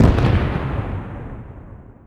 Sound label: explosion